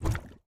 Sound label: Step Lava; Game rip; MiSide